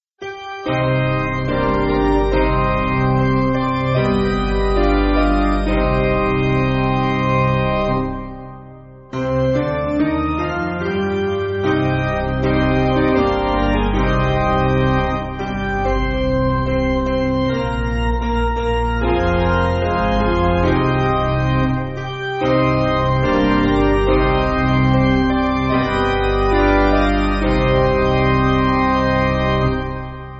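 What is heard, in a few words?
Piano, Music Backing Files for Churches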